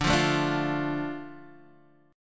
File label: D Minor D Guitar Open D